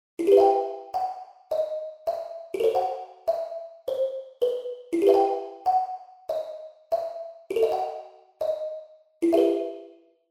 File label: melody